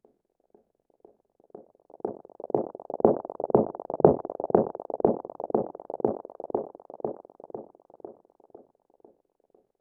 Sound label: one step forward in slow